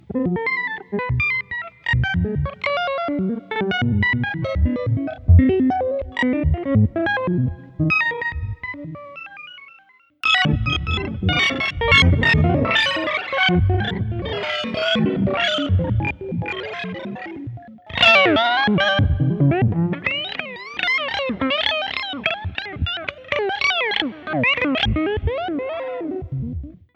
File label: pitch step glider; molecular patches